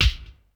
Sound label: TAP; UFC Tapout